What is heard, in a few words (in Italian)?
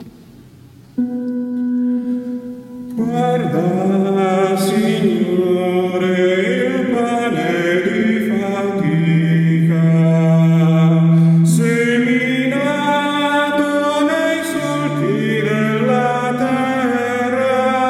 Come Pane Nuovo Soprani